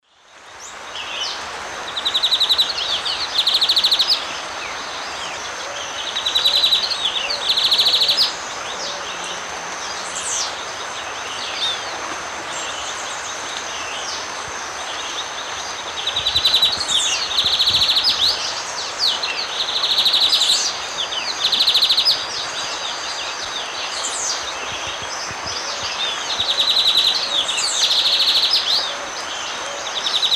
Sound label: Sporophila bouvronides, songs 2 males; S. America; Eastern Lowlands